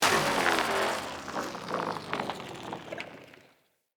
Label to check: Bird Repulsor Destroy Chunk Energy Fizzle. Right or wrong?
Right